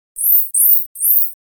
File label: dolphin whistle